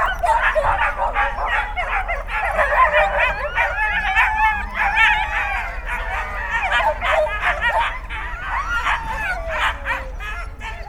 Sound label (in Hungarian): szánhúzó koncert beszédfoszlány, kutya; állathangok; paraszt udvar